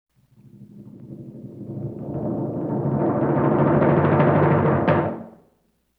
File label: LoFi Timpani Roll; Vintage Cartoon; Drums & Percussion